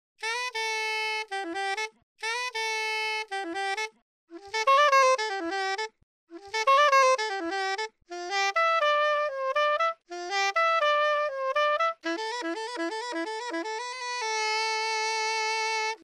sopran tweety

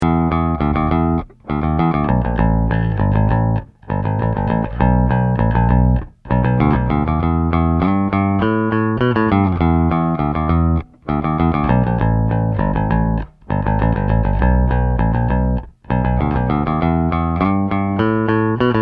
plektrum

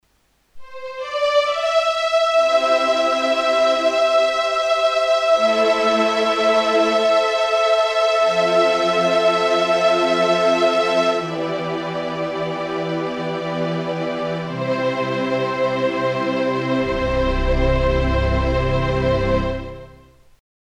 Alesis Recital Pro Streicher

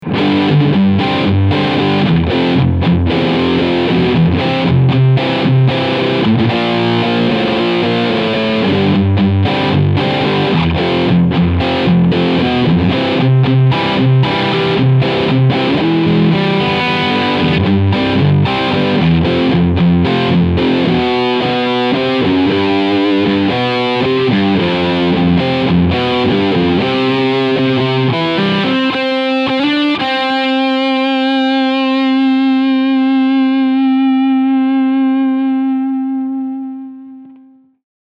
Harley Benton SC Custom Plus EMG OCF Riff Sound Steg PU

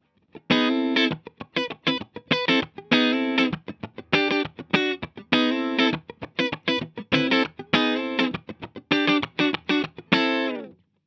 Teletronix LA-2A Peak 9 Uhr; UAFX

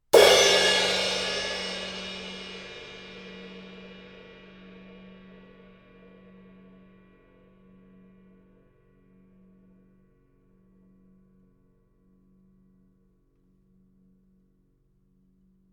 becken

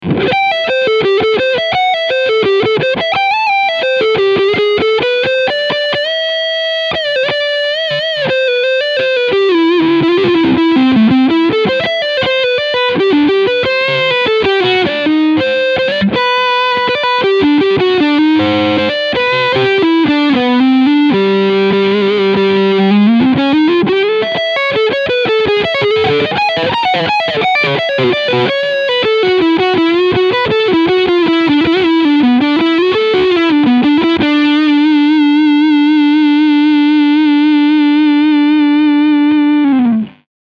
Heritage Guitar H 150 OSB E Gitarre Lead Sound Neck Pickup

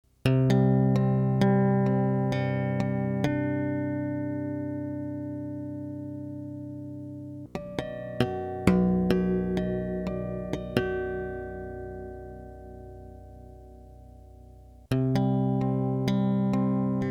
EBS DI Obertoene; Bogart

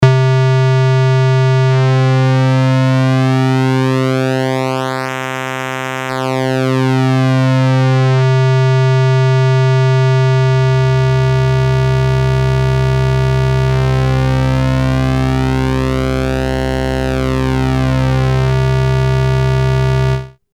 Korg NTS-1 Rechteck

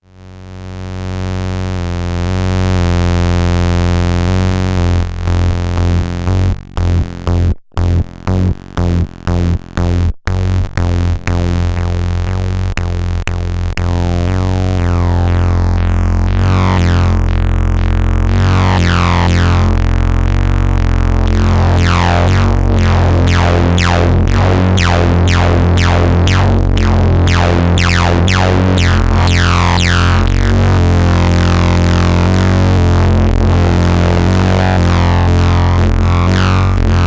AnamonoXmini OSC Type 2 SUB OSC Filter mit diversen Werten; Gotharman

